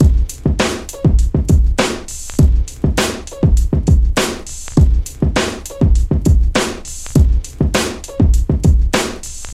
101 bpm high quality drum loop A sharp key, kick tuned to the A# note; drum loops